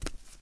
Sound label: wood barefoot, Footsteps